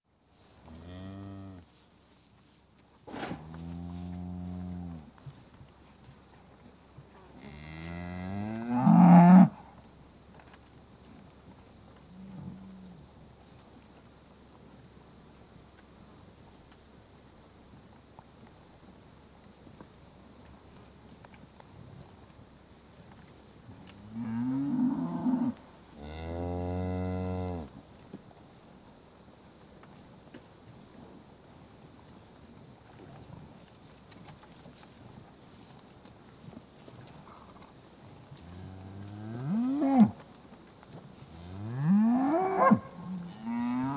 South Devon suckling herd being fed in field, very close perspective; Livestock; BBC Sound Effects Library